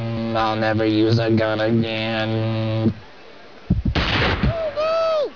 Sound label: gun